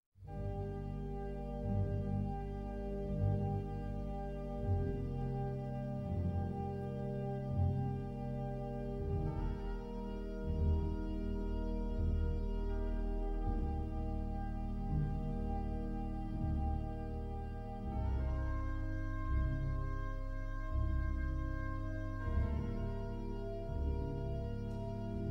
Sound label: Adagio For Strings and Organ in G Minor; Giazotto